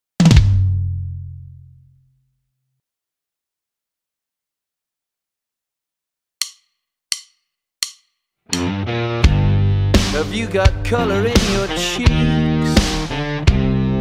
rock pop drums

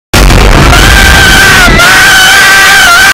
screaming errape sound effect short loud; Mario

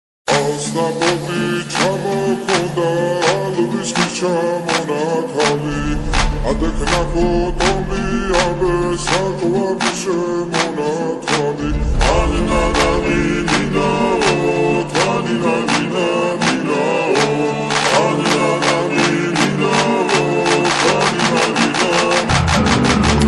Georgian folk, slowed; Gandagana

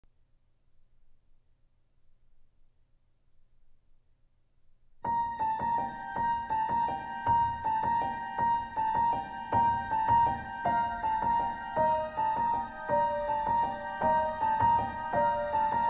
christmas piano cover

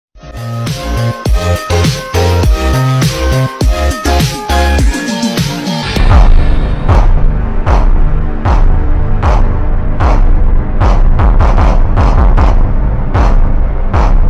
instrumental ultra slowed

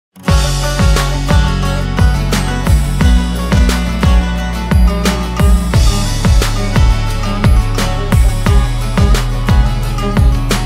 sound remix

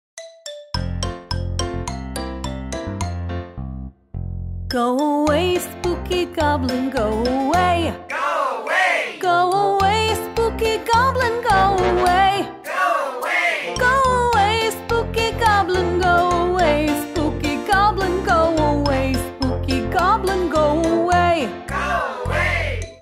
kids song

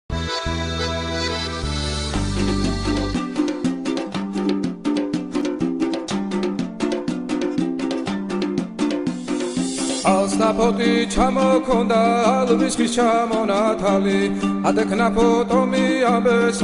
georgian folk song; gandagana

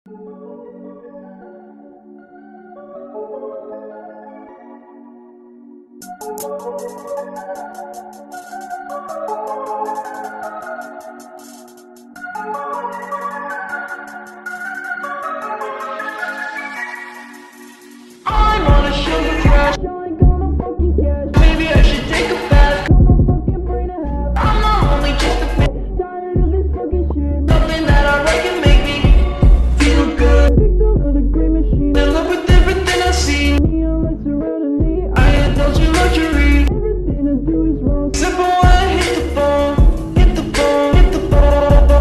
slowed reverb underwater

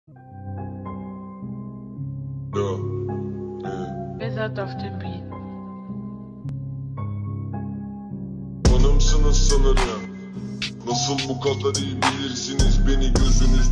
slowed reverb

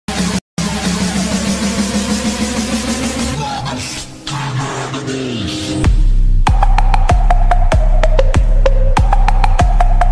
Bass music remix bass boosted car mix; tiktok music

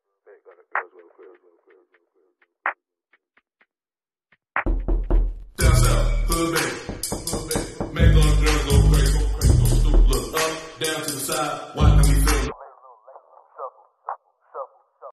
8d music 8d audio

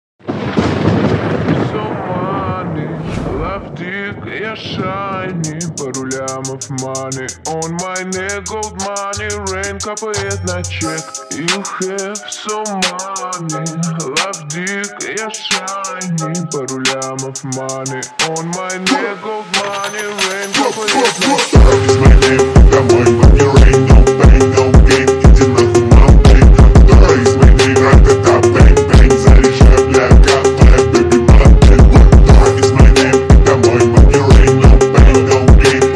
phonk slowed reverb

